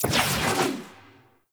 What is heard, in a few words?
Camera Spot Move; Gameplay; Warframe; Chimera Prologue